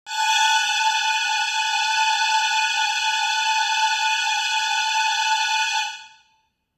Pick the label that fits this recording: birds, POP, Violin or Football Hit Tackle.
Violin